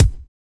bass drum single hit c sharp key; kick drum samples

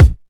deep kickdrum sound d sharp key

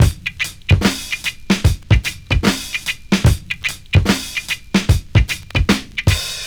74 bpm 2000s breakbeat sample d sharp key

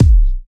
nice kick d key

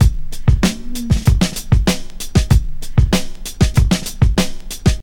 96 bpm breakbeat sample c sharp key

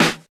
original snare drum e key

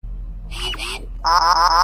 robotic bleeping

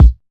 smooth steel kick drum a sharp key; kick drum samples

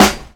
focused snare one shot c sharp key; snare drum samples

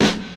modern damped snare drum sound g sharp key

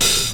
dark open hi hat sound a sharp key; Duration 0:01

